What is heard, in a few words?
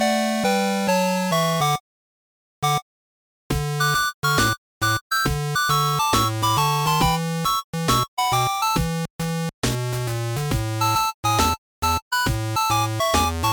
8 Bit Game Theme